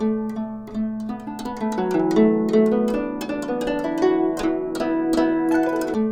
GUCHIN 90 D; INIS - Opium

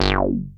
ARP 2600 F#2, synthesizer; Hollywood Edge - Giorgio Moroder Rare Synthesizer Collection

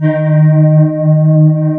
CMI ORGAN

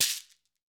SHEKERE, Latin Shakers

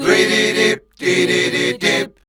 Voice Spectral, H PHRS

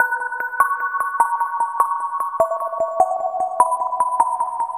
Shimmer Mallet

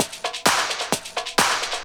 130 addon, DRUMLOOPS, HIHAT; Ueberschall; Techno Trance Essentials; LOOP ADDON4

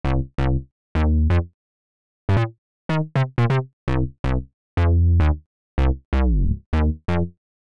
Synthbass C, 125; Nova; PowerFX; Nu Jazz House; Basses

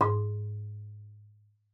marimba G#2; Xsample VOL-4

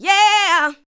ADLIBS 90; Ueberschall; Jam Box

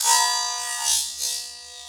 SFX, Drum & Bass, 160 BPM

